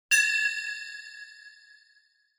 Accent

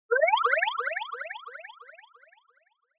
Motion Slide Fast Delay

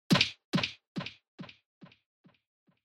Motion Grab Delay; モーション 掴む; ディレイ

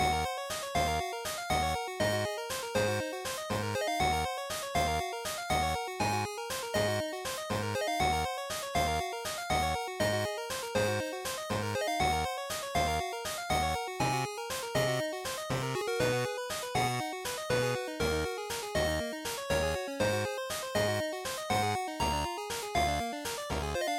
Dungeon Loop; GB; RPG

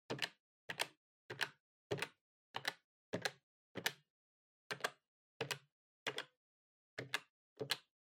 Calculator Single Gentle

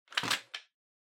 Vacuum Cleaner Head Pick Up

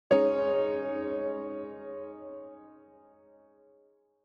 Piano Short

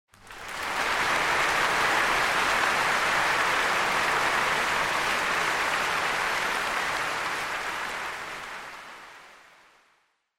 Applause Crowd, Mid

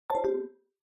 Smartphone UI Sound Dry

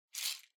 Spice Mill Pepper Shake

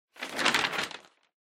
Newspaper Flip

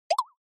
Percussive Accent High

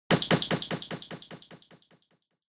Motion Grab Delay High; モーション 掴む; ディレイ 高

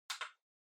Portable MD (Far Button)

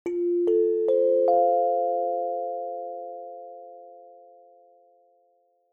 Chime Announce

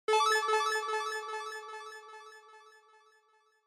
Multi Accent Low Delay

